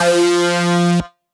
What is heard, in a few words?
Lead - F